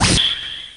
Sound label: cut in; melty blood